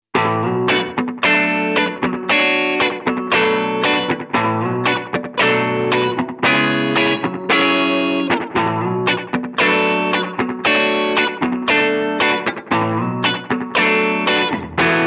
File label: Slap No Mod